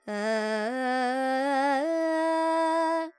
Panthuvarali, Carnatic Female, alaps, vocals, 100 G, dry; Sample Banks